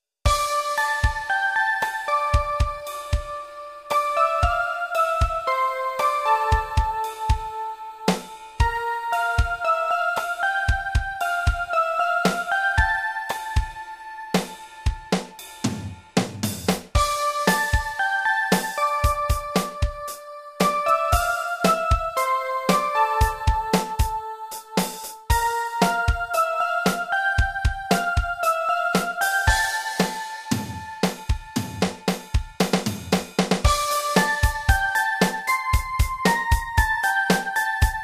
arrange drum

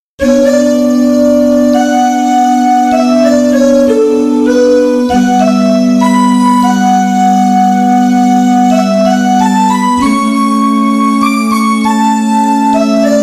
voice str

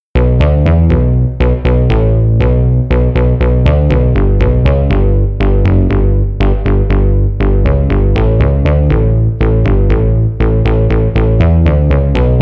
synth bass

